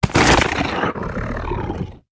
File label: Felhound Mount Death Underlay